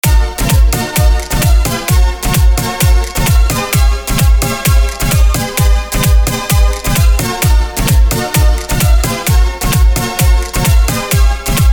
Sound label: Drum Loops & Synth; Carriben